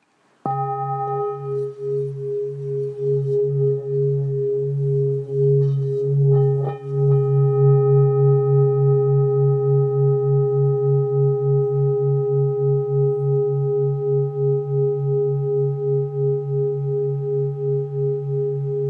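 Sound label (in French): Bol do dieze, bols chantants tibétains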